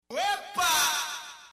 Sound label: ueepaaaaa